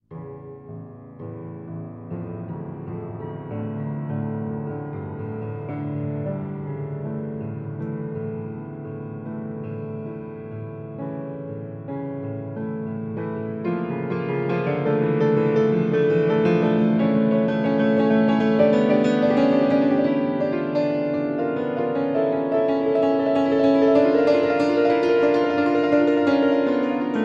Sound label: Piano Solo